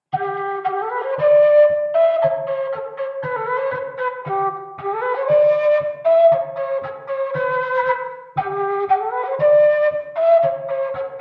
Bourree; Recueil De Raoulx

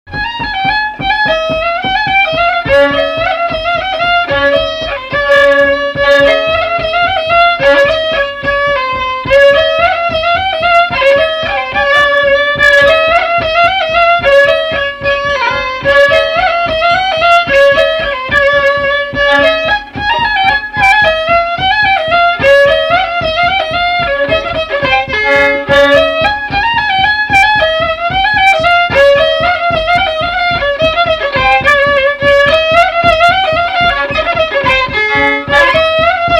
Bourrée; Bourrée Passa aqui

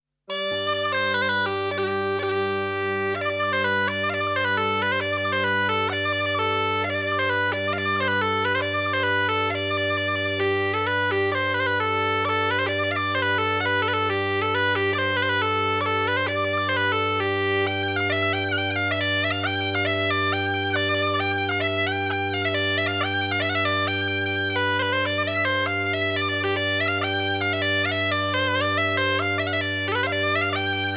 Sautiere; Sautiere de Bordas

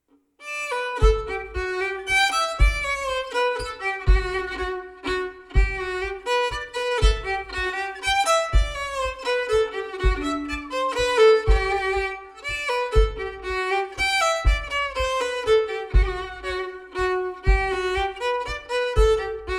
Valse; Les premieres valses 1ere livraison manuscrits regionaux

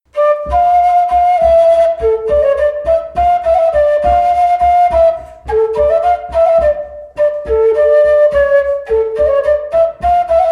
Montagnarde d Auvergne; Recueil Gille

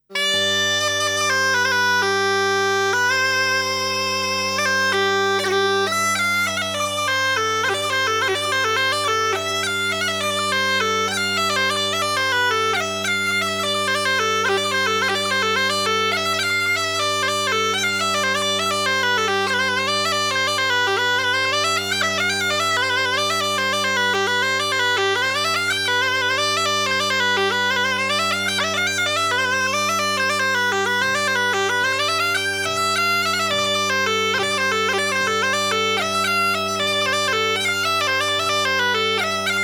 Bourree; Bourree de Ligoure

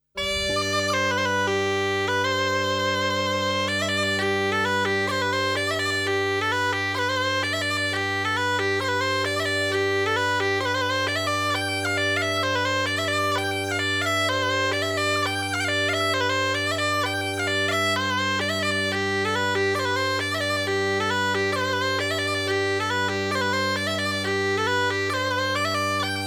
Sautiere; Sautiere de Solomagne

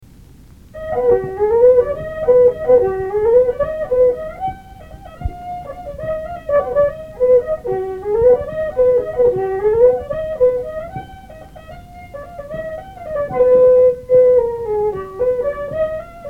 bourree

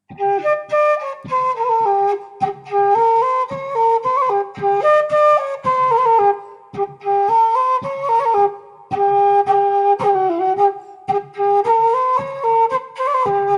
Branle limousin

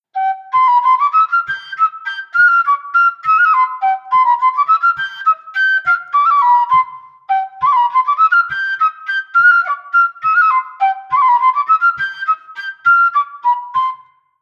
Airs limousins Bourree; Lagueny; 3e theme

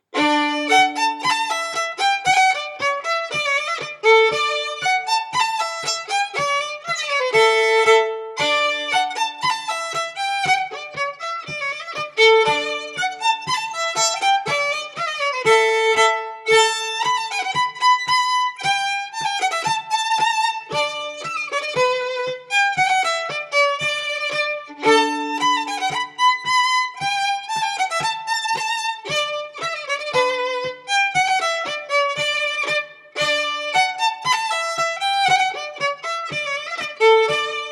Bourree; Ms Aurillac

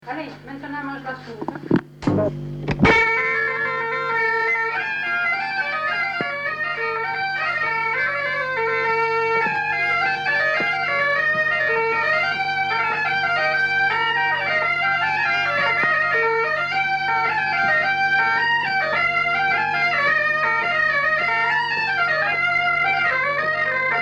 Polka; Polka de Gavinet

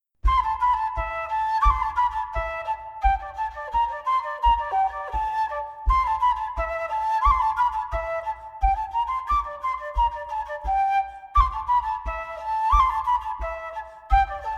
Bourree berrichonne

